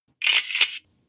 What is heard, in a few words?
shotgun rack